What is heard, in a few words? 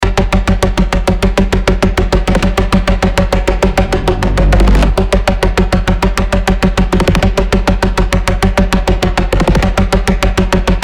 action loop 100 bpm; brvhrtz